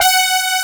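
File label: brass G4, synthesizer, analog brass; AKAI S6000 CD-ROM - Volume 1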